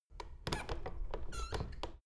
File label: door plastic handle use creak soft